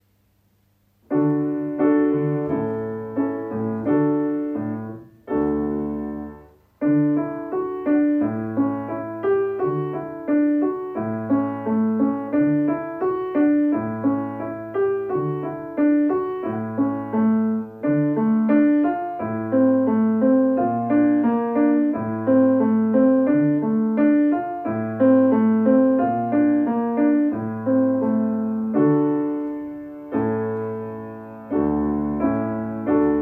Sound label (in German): Klavier